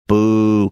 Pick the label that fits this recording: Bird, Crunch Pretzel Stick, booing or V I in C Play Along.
booing